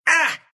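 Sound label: pain, Russian; dota2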